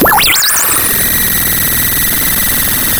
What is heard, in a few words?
pop, Sound of a cat meowing